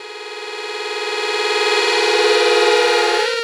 Dubby Reverse Swell; Sonic Foundry (Sony Creative Software); Jazz Solos and Sections; Effected Sax

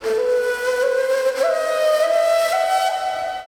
SIERRA FLU

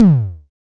FX SYN, Analog PER; Sound & Vision - Gigapack II

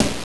Snare, Genesis; TMNT; M8

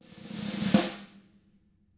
roll, snare; ILIO; Double Platinum Drums 1

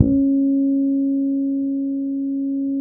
HACO C#4, Bass; Spectrasonics; Bass Legends CD 1

